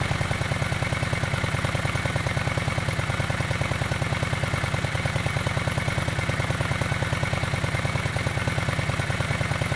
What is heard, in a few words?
idle, motorcycle